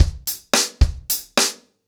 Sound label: Hip Hop 110BPM, acoustic drums drumloops